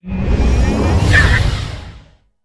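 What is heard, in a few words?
bee attack, monster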